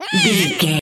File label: voice fun ant creature